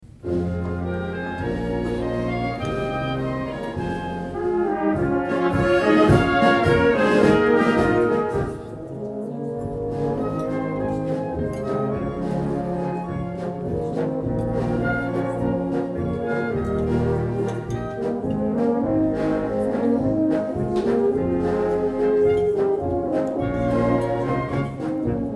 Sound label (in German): Polka